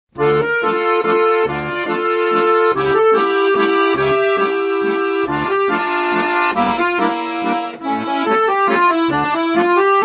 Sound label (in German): Russischer Walzer, Akkordeon; Akkordeon Workshop Band 2